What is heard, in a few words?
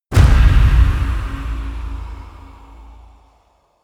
medieval click heavy negative; hits impacts